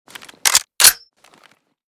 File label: fnc unjam, weapons; Mask's Reanimated Anomaly Arsenal